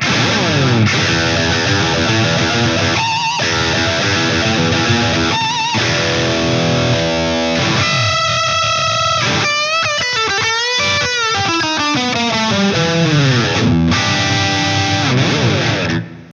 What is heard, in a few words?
wah tone Range